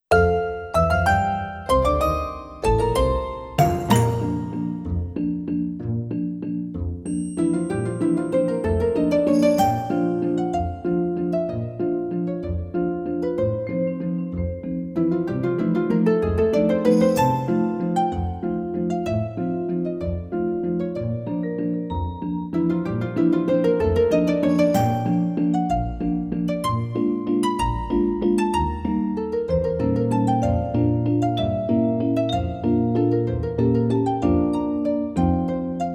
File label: Waltz, Music Box; Voices of Spring; Baby Einstein